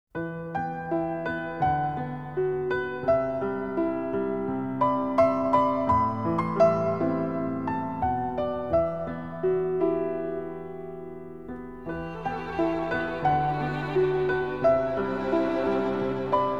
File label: Harp; Falcom Special Box 90; Sorcerian